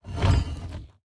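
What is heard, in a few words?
movement armor heavy; smite; god atlas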